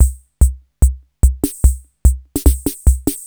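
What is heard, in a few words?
TANGO, drum machine; Crypton - DrumBase5000; TR-66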